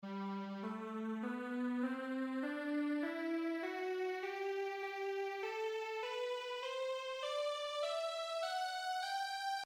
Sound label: g major scale, violin